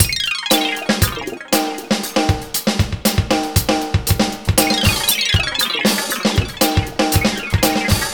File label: Gliss 2fer Full, lounge, 118; Zero-G Kocktail Kollection; Construction Kitz (Lounge); Gliss 2Fers Mixes 118